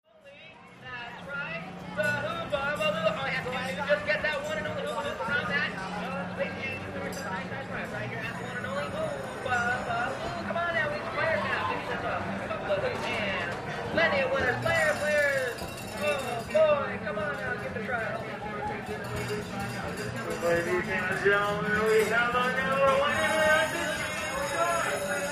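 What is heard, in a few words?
Carnival Amusement Park, rides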